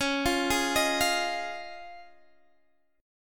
C sharp Major Add 9th, Guitar, Standard; arpeggiated; chord; 21,20,18,20,18,x; JGuitar

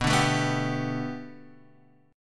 B Minor 7th Sharp 5th, Bm7#5 chord; strummed; x,2,0,2,0,3; JGuitar

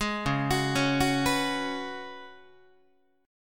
C sharp 7th Suspended 4th C sharp, Guitar; C#7sus4; arpeggiated; tuning=4A,4E,5A,5D,5G#,6C#; guitar chord

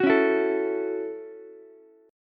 Gb Minor 7th Gb down Ukulele; Gbm7 strummed; JGuitar

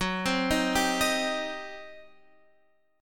G Major 7th Suspended 4th Sharp 5th Gb

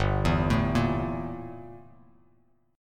G Minor 6th Add 9th G down Bass Standard

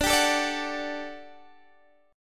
G Major 7th Suspended 2nd D

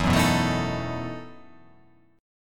E Major 7th Suspended 2nd Suspended 4th

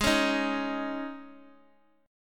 G sharp Suspended 4th G sharp; x,8,9,6

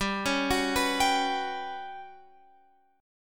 G Major 7th Flat 5th, Guitar Open F; GM7b5; arpeggiated; x,14,13,13,14,14

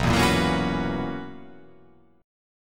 B Major 7th Suspended 2nd Suspended 4th B

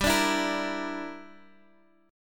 A sharp 6th Flat 5th, guitar chord

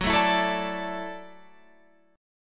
F sharp Minor 7th Sharp 5th, Guitar, Open F; strummed; 18,16,16,16,17,16

